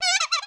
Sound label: boiled rice damage